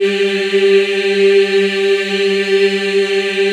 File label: G3, Choir; USB Soundscan vol.28; Acoustic & Synth; AKAI